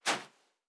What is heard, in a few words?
Footstep Sand Walking; Character Sounds